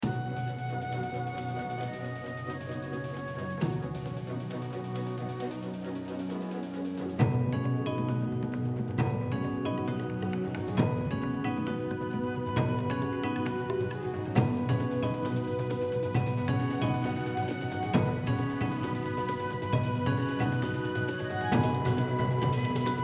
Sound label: Music; Tower Climb